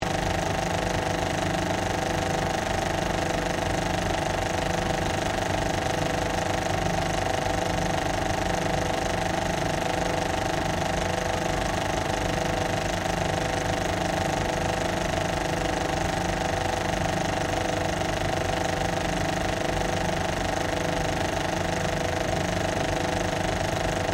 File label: PROJECTOR CINEMA 35MM OPERATING CLOSE LOOP, Modern Cinema projector; Projectors